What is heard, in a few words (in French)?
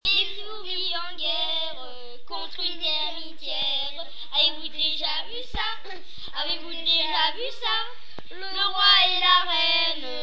groupe, chant